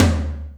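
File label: Tom Ambient Floor, Alesis D4 drum machine; 300 Drum Machines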